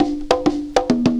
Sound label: chants go hey; Drum loops